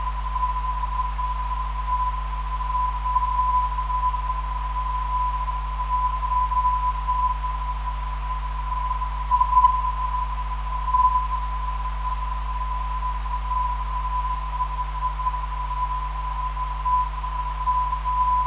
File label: 496kHz, ndb